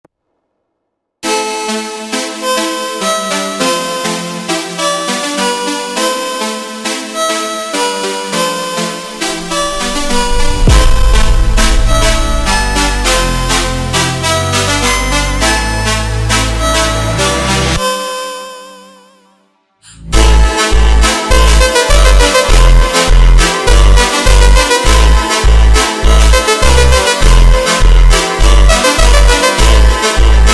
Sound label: instrumental Slowed, funk